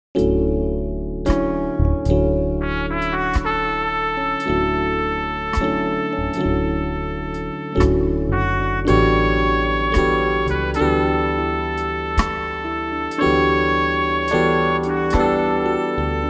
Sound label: MIDI ditty